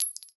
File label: bullet out, Deagle